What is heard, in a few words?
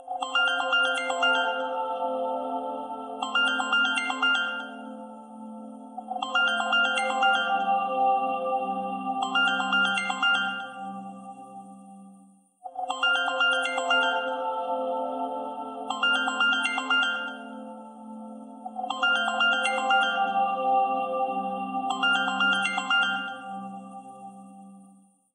Samsung A33 Ringtone